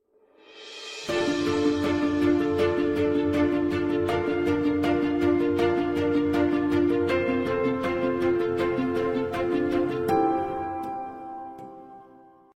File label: Inspirational